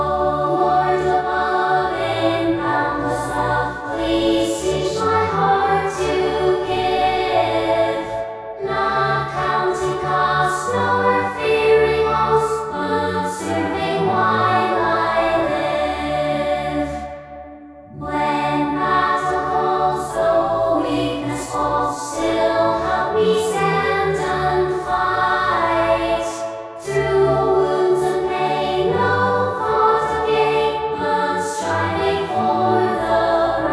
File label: choir